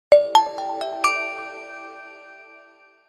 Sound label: Calendar alert, Nokia alert tones; Asha 303